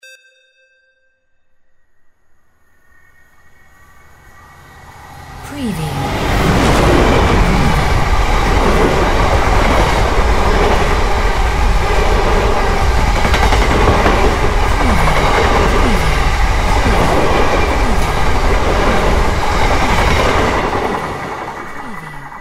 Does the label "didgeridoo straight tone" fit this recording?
No